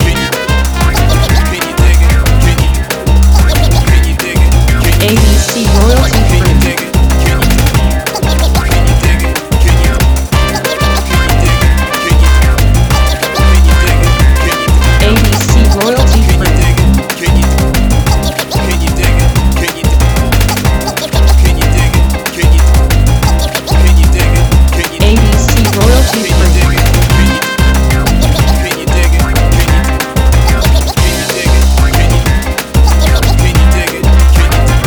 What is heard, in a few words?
bossa loop